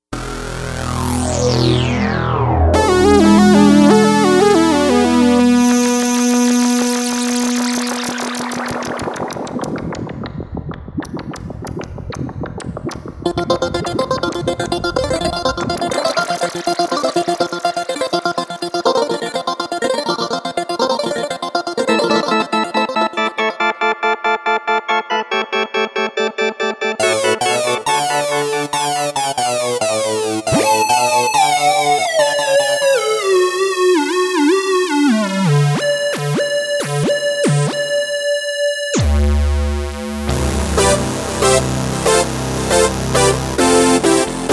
Ion Vintage Synths

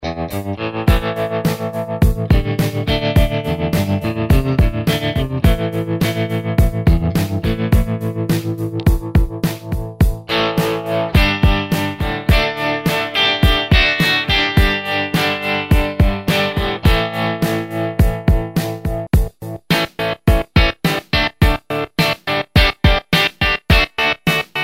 tremelo

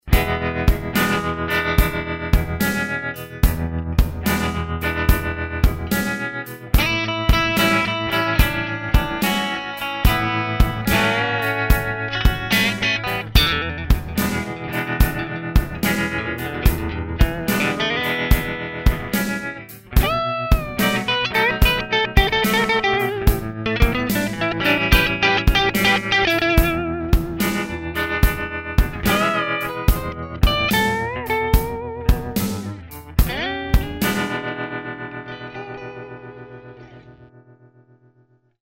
Bassman

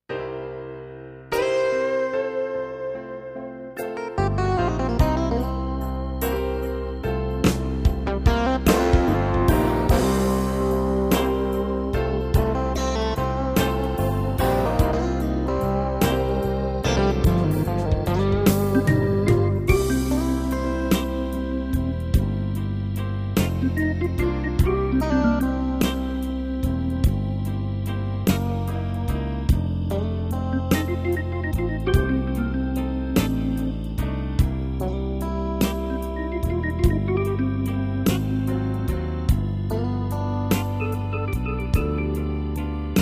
Tyros Style Session Slow Blues

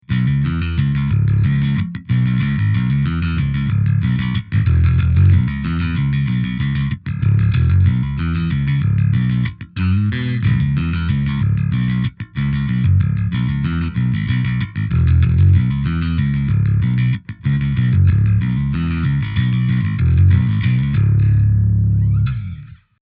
modern rock; pod x3

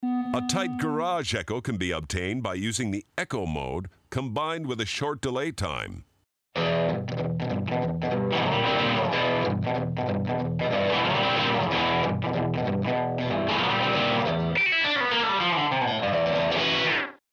Garage Sound

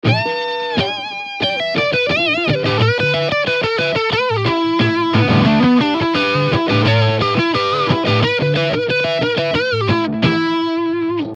lead

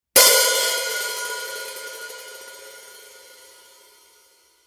14 paragon hats

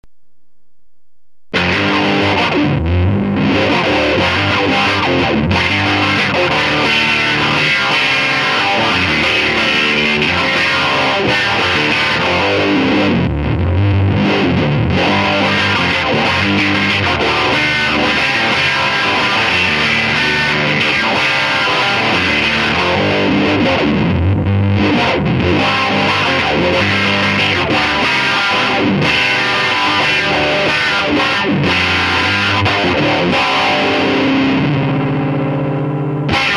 Tube Zipper Rock

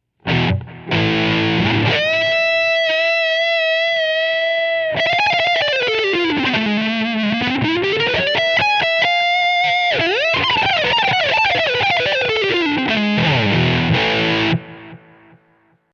shreddy metal

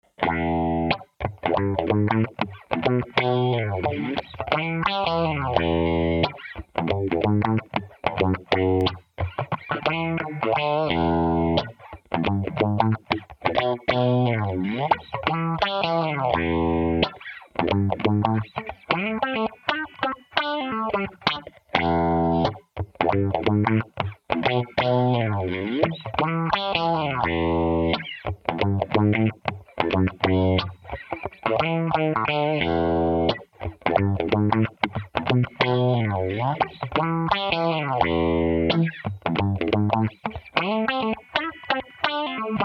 Envelope Funk Phaser

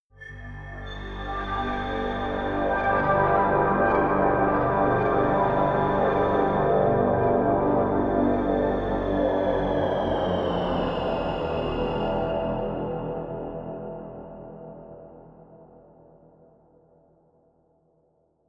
ether orch

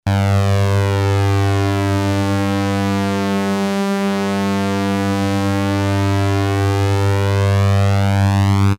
saw; BIG MF103

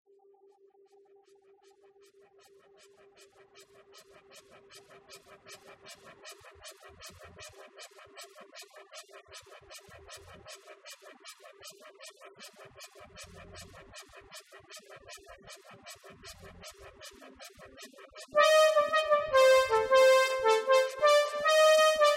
Prophet 10

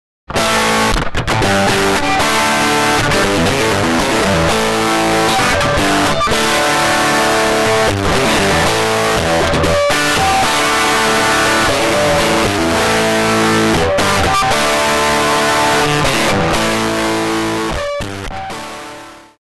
Rhythm Crunch Tube Zipper

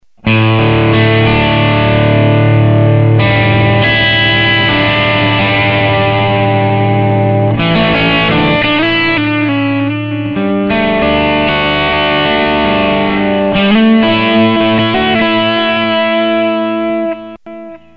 vibrato

